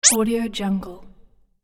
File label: arcade game slide squeak